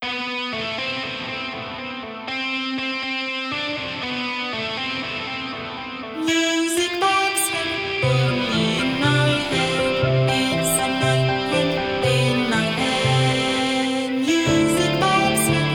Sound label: Music Box